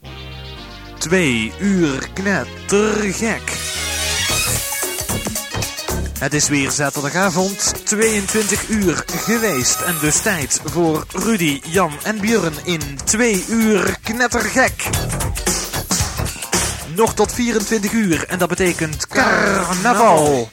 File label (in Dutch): Jingle lang; Knettergek